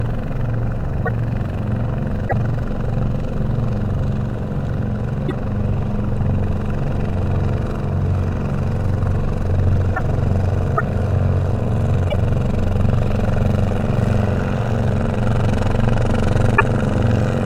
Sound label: BOAT; pictcode